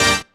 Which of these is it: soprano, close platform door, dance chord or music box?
dance chord